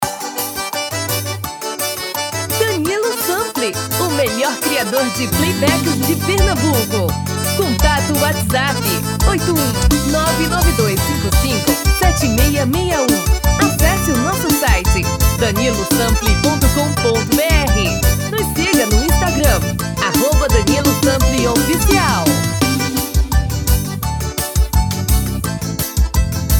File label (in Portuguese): Xote